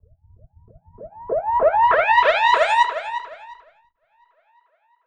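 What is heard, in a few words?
alarm siren, filter processed, 170bpm; musicradar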